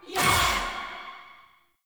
Choir Erupt Proj Throw

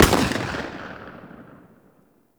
Weapon Enemy Tail Outdoor; Weapons; Warframe

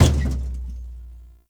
Stadium Floor Tom Destroy; Ambience; Gameplay; Warframe; Nineteen Ninety Nine

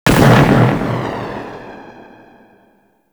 Skiff Drone Close Explosion; Warframe; Archwing

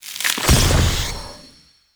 Ground Spike Destroy; Enemies; Abilities; Warframe; Zariman; Void Angel

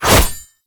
Bayonet Melee Whoosh; Warframe; Tenno

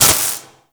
Plasma Grenade Hit

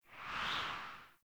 Pre Landing Wind Gust, Falling Wind; Player

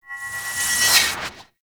Melee Heavy Attack Charge; Warframe; Whooshes; Heavy Attacks

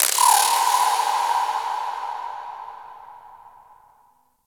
Ocean Underwater Critter Small; Warframe; Grineer Ocean

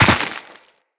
Tenno Land Dirt, Footsteps; Characters

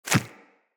Inf Small Walk Default, Footsteps; Infested

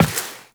Step Default, Footsteps, Walk; Warframe; Characters; Tenno

